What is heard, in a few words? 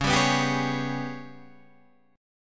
G sharp Minor Major 7th Double Flat 5th, chord; 9,10,9,12,9,9